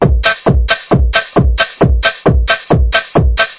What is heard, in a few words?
134 hard simple, DRUM LOOPS and BREAKS